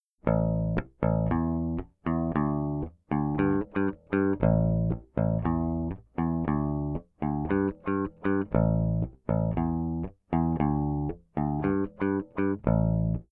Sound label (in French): grave aigu mediator, bass; smpro